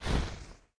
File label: Light on; The Denpa Men; Android